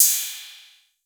HIPHOP KIT CYM; Wizoo - Platinum 24 Electronic

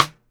RIM MF

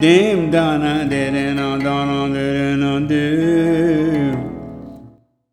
Classical, Vocals Male; Sonic Foundry (Sony Creative Software); World Pop

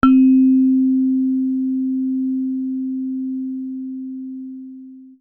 Vibe; Coldcut's Kleptomania VOL1

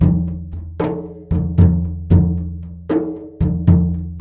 MLY DRMS; Spectrasonics - Heart of Asia CD2

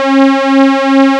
SAWS

